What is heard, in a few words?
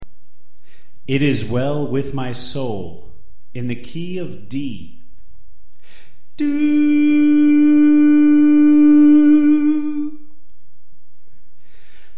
Tenor Left